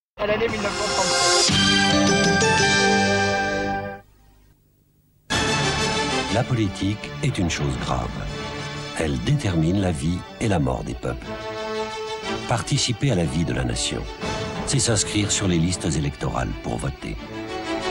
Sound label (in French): jingle publicite bande annonce noel; tf1